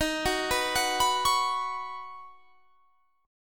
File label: D sharp Minor 7th Sharp 5th; arpeggiated